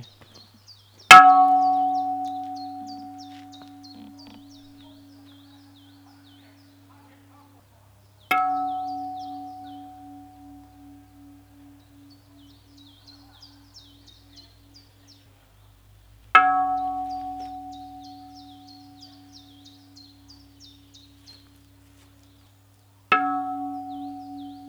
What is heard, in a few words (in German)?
Glocke